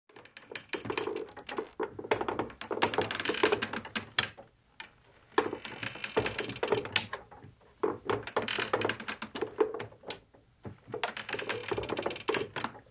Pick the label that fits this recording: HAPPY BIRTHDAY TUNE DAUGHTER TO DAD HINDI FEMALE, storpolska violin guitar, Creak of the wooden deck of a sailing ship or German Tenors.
Creak of the wooden deck of a sailing ship